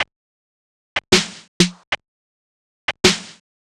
drum roger percussion 125, Drum Loops, Classic Chicago House